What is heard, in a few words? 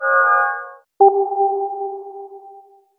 Skill Unlock; Alterna